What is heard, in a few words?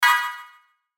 Shimmer Burst; Multimedia; Blastwave